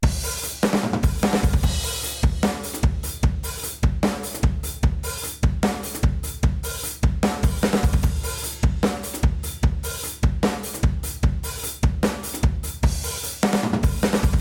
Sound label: drums